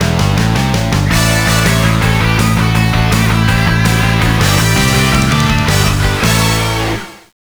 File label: Goal; Midnight Run Road Fighter 2; Konami ZR107